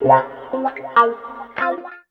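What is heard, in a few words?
guitar; East West - Phat & Phunky CD2; 90's Sample CDs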